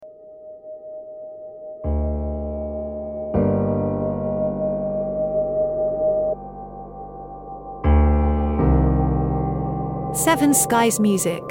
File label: jazz - sad